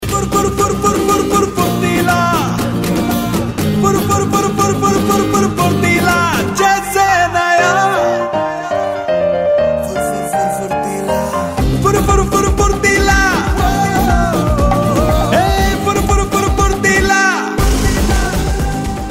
Castrol Activ Feel Zippy TV ad fur fur furtila song